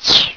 Swish; Ultima III 1.4.2; Ultima Sounds

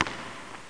ball; Magic

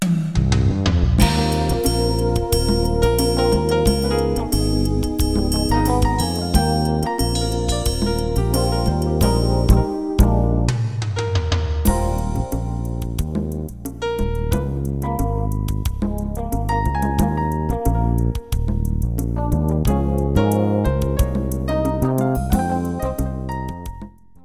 pop rock

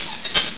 wheel

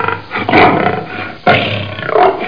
Lioness

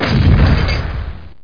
IC EXPLOD; landslor

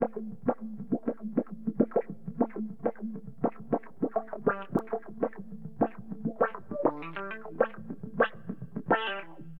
WAH, Guitar; LiveAct Pool; AcidJazz Funk

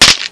bone

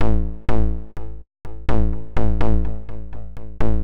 XTRA HOUSE 125

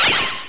Sound twinkle

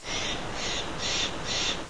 jay; The Yosemite Collection; Curtains; Lower Valley

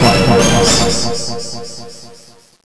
fireworks; Woden 1.3.4

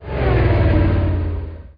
cars

